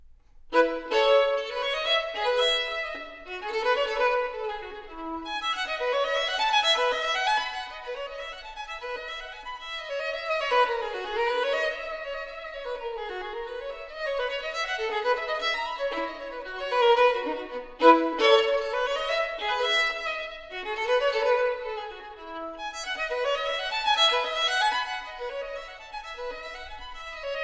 Partita No 3 in E Major BWV 1006 Bourree, violino solo; Bach; Sonate e partite per violino solo